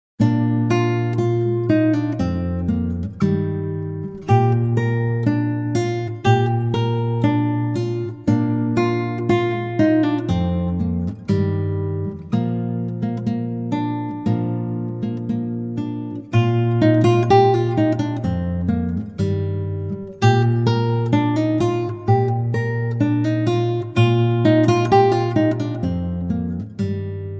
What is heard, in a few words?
scottish air